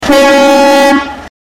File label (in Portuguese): Buzina; Comboios